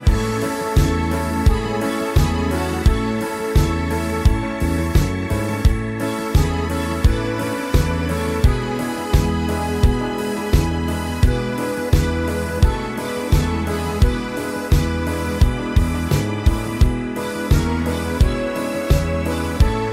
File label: Karaoke Version